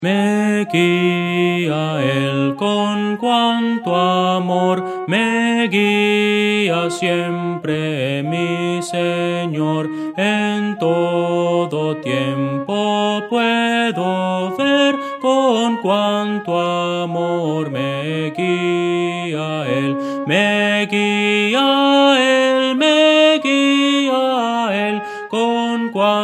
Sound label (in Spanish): soprano; Voces para coro